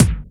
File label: crispy bass drum single hit a sharp key, Royality free bass drum tuned to the A# note.